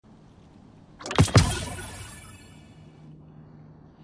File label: Rare Drop